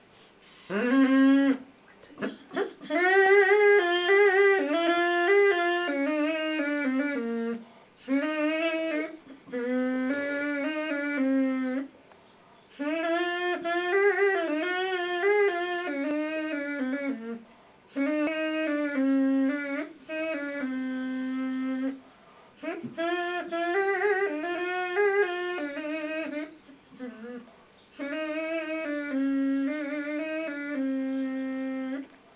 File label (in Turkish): cam düdüğü